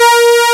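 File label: SAWTOOTH, ANALOG; FAIRLIGHT CMI IIX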